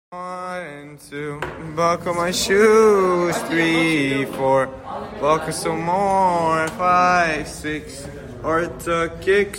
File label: kicks; arta